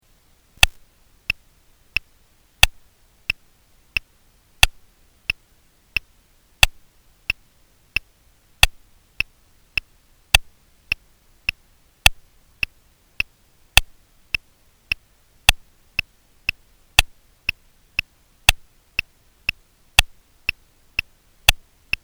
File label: Triplets 30bpm to 80bpm